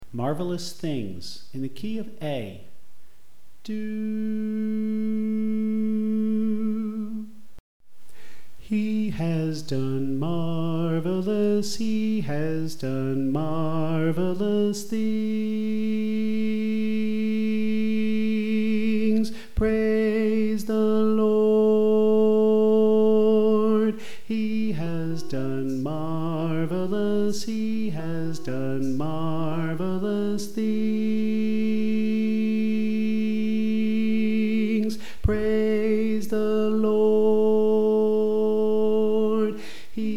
Soprano

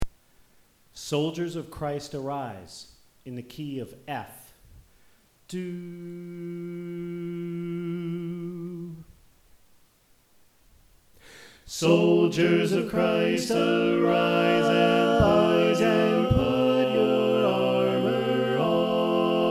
Soprano Left

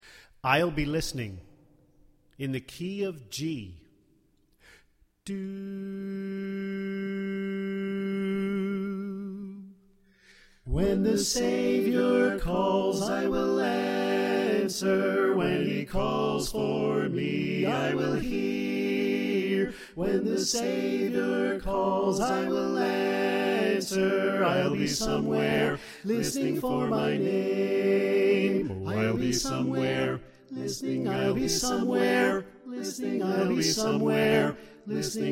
Bass Left

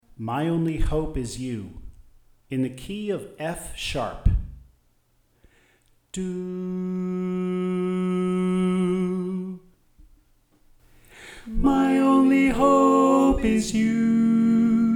Tenor

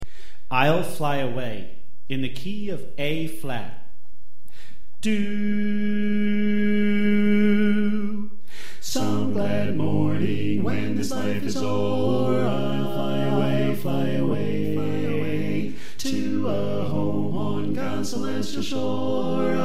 Soprano Left

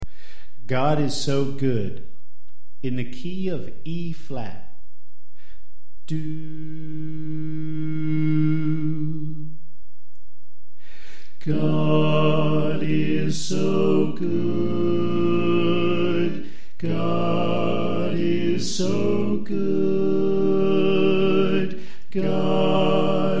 Tenor Left

